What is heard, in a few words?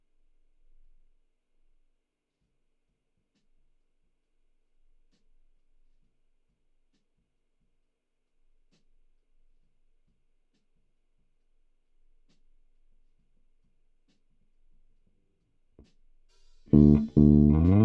BASS samp